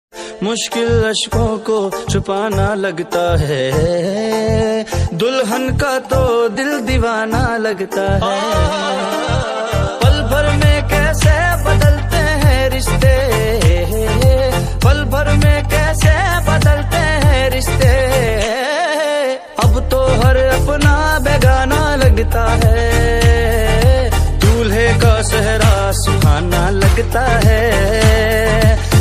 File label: bollywood ringtone